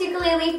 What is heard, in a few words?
ukulele